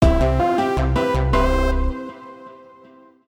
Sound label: MUSIC EFFECT Platform Positive Fast; stereo; Universal Sound FX; MUSIC EFFECTS